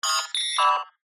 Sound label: science fiction robot android beep chirp classic